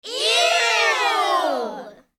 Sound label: children shouting ewwwww